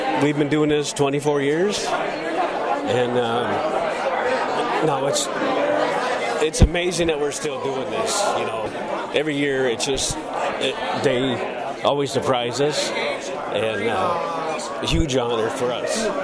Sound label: BAGPIPES